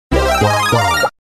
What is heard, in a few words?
Penguin Suit Power Up; NSMBW